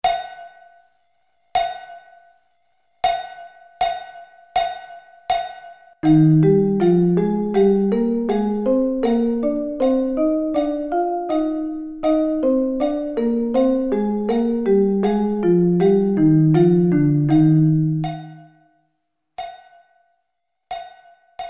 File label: Thirds; Eb